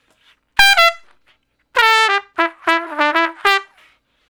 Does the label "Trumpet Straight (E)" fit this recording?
Yes